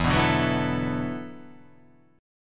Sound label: E 9th Suspended 4th E down